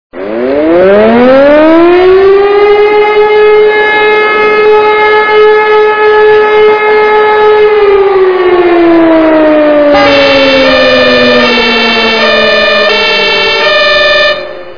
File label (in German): feuerwehr